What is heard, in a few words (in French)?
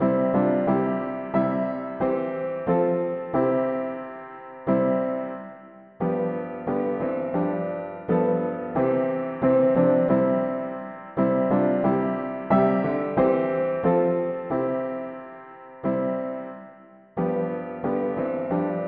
mono piano, cantiques; hymns; Jesus frappe a votre porte ouvrez lui